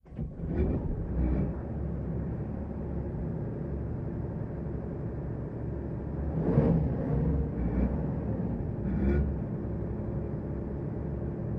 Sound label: Military Pbr: Onboard Start-up, Accelerate To Medium Speed, Slow Down And Off. Some Water Movement. Dual